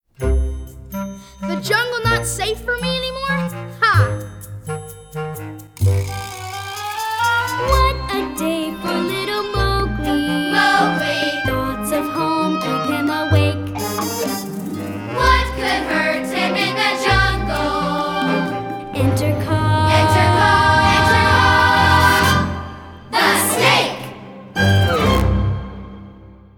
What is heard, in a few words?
vocals; kaa the snake